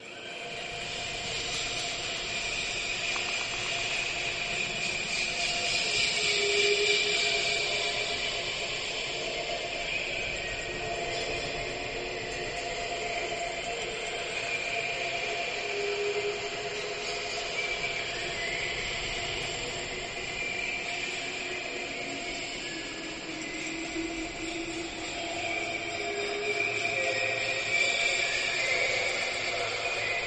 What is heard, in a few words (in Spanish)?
aves